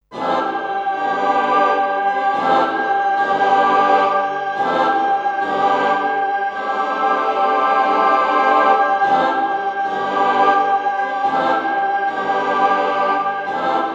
Choir Tape; dexibell

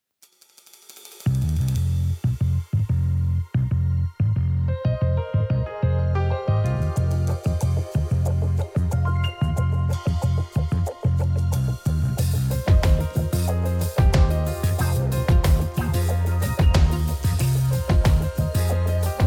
Bassist SLAP; Virtual Bassist; ujam

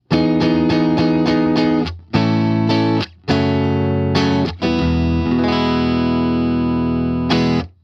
Stratocaster Middle

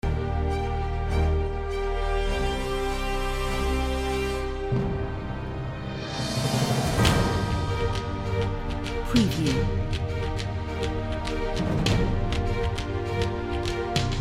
cinematic, cinematic orchestra with epic drums; Audiosparx; suno

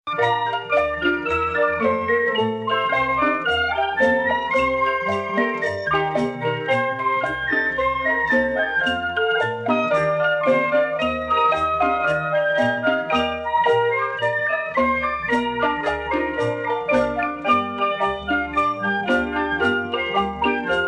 jazz, Chinese Style Jazz; suno

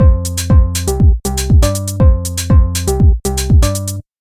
Bass After

